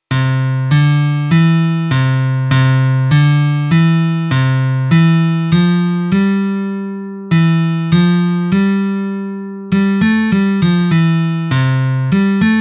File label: FREREJACQUES MELODIA